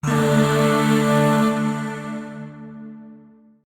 Shawzin Chromatic Chord C; Warframe; Zariman; Void Shawzin; Chords

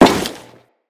Crewman Step Default, Footsteps; Corpus

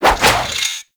Movement Flip Whoosh; Warframe; Kela De Thaym

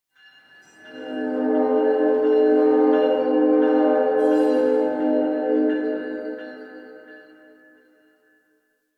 Distant Train A; Warframe; Ambience; NineteenNinetyNine

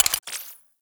Blastor Pistol Mech

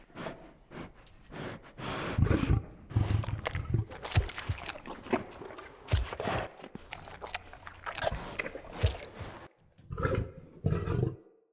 Wolf Idle Four Lick, Animals Wolf Vocals

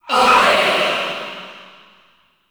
Hand Stunblast Away; Warframe; Duviri

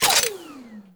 Emplacement Mech End; Grineer; Weapons

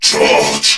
Surprised

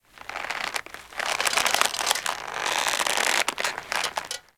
Rope Creak, Carnival; Warframe; Ambience; Grineer

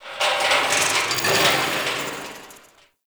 Metal Scrapes; Ambience; Warframe; Corpus Railjack

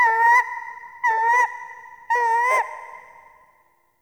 Critter Random; Warframe; Ambience; Nokko Colony

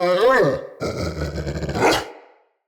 Battle Dog Thankful; Animals; Vocals; Snc Battle Dog

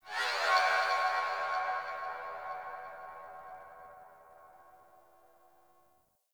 Sentient Harmonic Cries; Warframe; Tau

